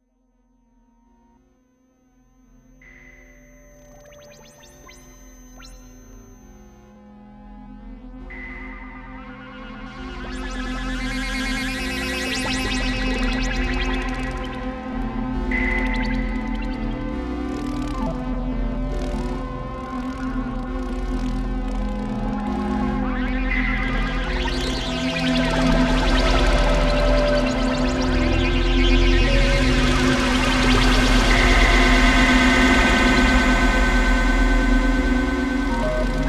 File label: first move ensemble